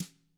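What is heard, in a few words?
TAMA brass snare, Drums; ILIO; Double Platinum Drums 1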